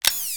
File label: toggle off; Original TK Sounds